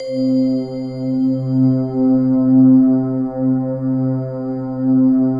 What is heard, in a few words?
World of Synthesizers, SYNBELL